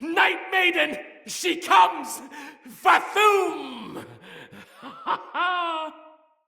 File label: Cry, Soldier Male, Battle; Dendrit